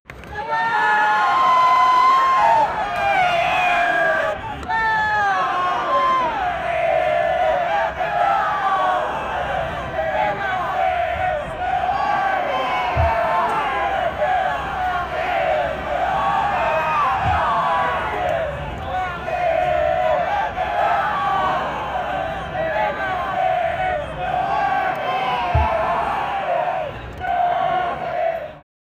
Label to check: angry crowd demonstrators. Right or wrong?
Right